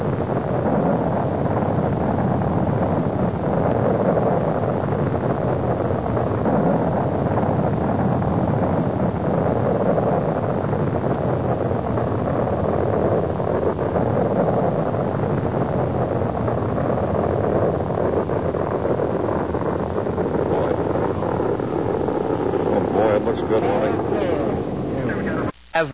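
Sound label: rumble; race-into-space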